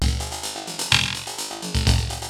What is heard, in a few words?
LOOP, TECHNO; TECHNO-BOX; ELEC-LOOPS